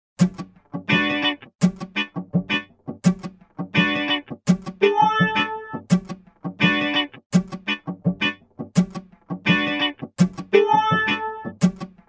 wah guitar; emu mo phatt

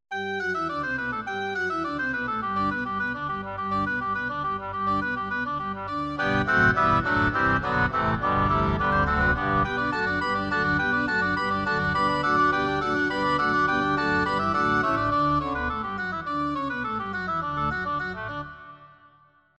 pipe organ, yamaha tg500

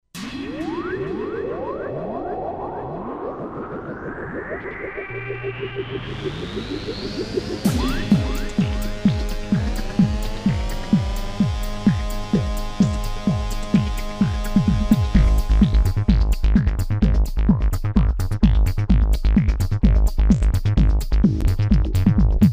korg z1; factory demo 3

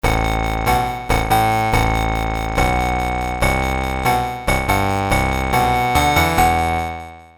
synth like ring, roland jd800

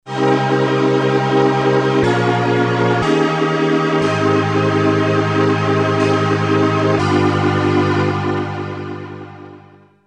pad lounge chords; Solton Project 100; Solton SM100

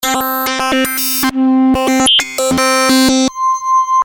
pcm, roland jd800

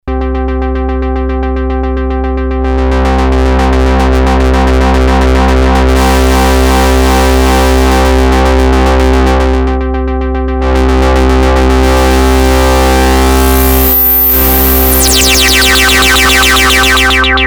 distortion, novation bass station 2; TEST: distortion; analog monophonic machine with subtractive synthesis

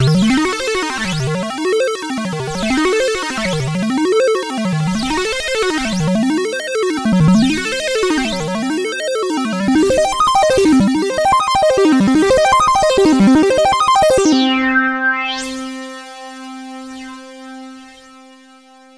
synth square arp, access virus

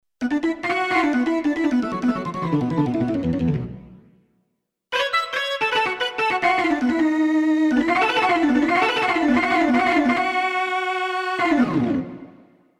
org hammond, Alesis qsr synth

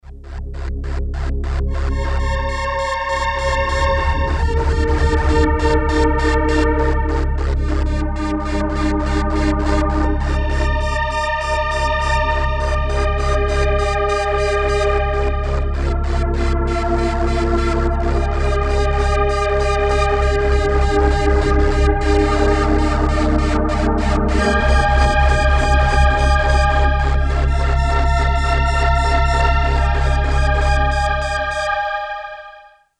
pad square; Solton Project 100; Solton SM100

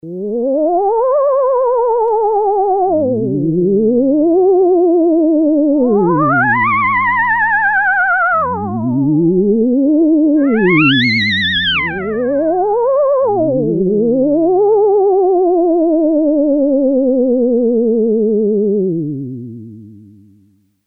fx scary, synth Roland alpha juno

